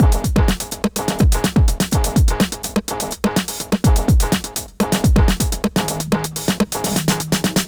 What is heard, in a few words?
Hardcore Breakbeat, 125 Emin; Big Fish Audio; Hardcore Breakbeat Action; Marsattax